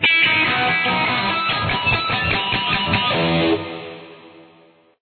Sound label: harmonics, guitar